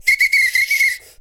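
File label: foudang groove; Best Service; World Colours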